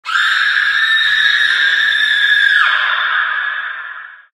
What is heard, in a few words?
female scream, halloween; Source Engine; tf